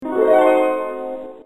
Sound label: Harp; Halloween; bruitage